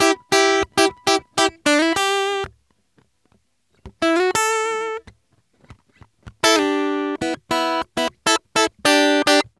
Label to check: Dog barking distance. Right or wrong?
Wrong